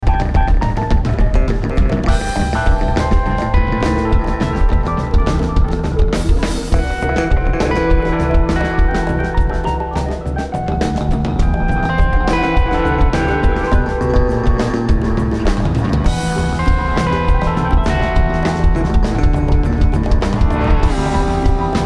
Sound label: Drums and Bass